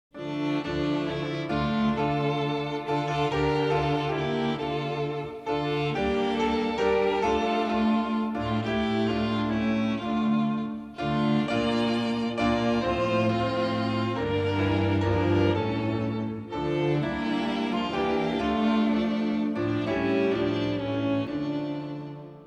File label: ring out wild bells instrumental, hymns